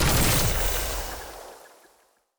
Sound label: weapon sporelauncher; Stellaris; grand archive